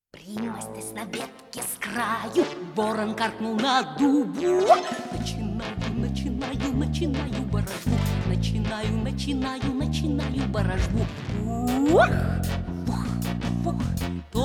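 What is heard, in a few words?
instrumental ensemble; melodiya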